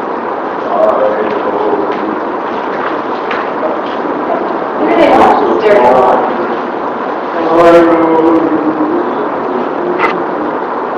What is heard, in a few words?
Moaning